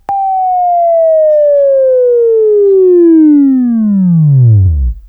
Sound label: WAV Right, Sweeping; Labview-Program; Sweeping Training; Buzz